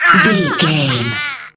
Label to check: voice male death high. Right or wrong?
Right